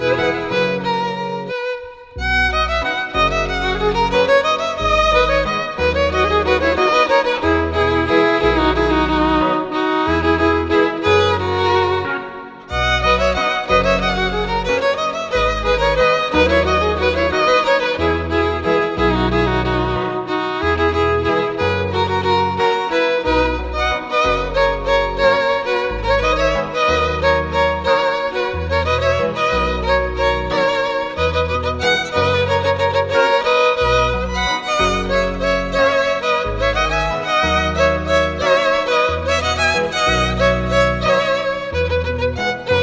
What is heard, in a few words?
music